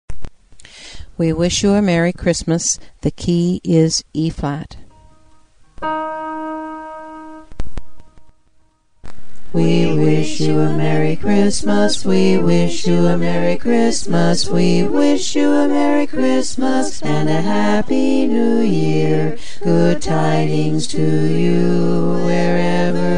BASS; WE WISH YOU A MERRY CHRISTMAS; holiday music

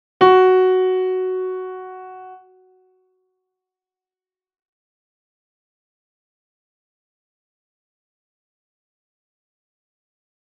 Carol of the Bells Bass Alone; holiday music

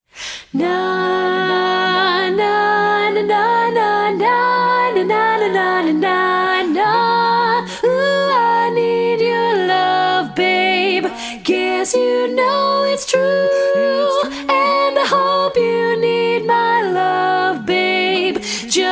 Tenor Dominant

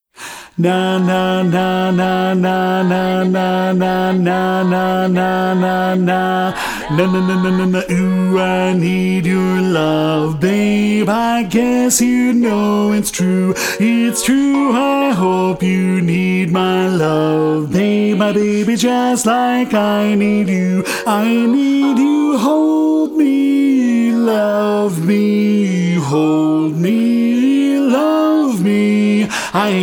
Bass Dominant

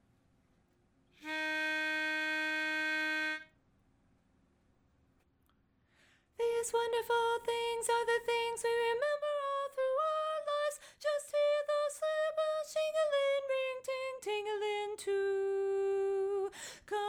Tenor Alone; holiday music

